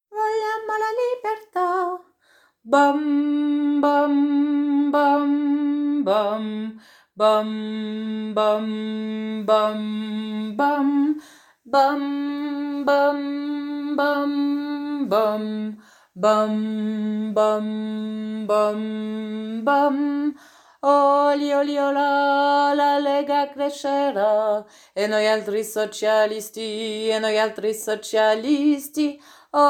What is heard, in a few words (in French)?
basses entree 2eme couplet; La lega